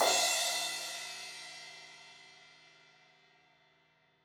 Crash 16in edge, Cymbals; Deeply Sampled Drums

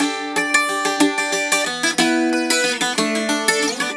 Celtic Flavours; USB Soundscan vol.42; AKAI

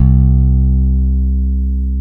C-2 I-BS, bass

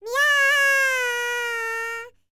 PERSIAN, World Voices; ILIO; Vocal Planet VOL-5

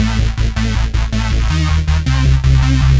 future rave samples, 160bpm, A

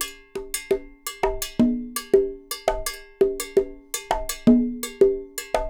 Percussionworks

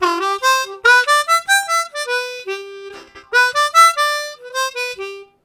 Climber, Harmonica, Riffs; Zero G

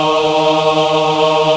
Aahh Chor, VOICE; Best Service Gigapack 1

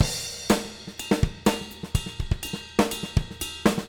124 CRSH, Living Drums

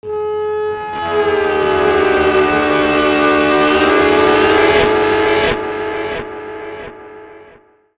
FX DIST; FX DISTO

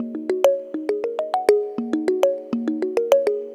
Tongue Mallet; Melodic; Ambient-Melodics-II

